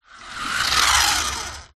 leisure radio controlled car approach from side stop; zapsplat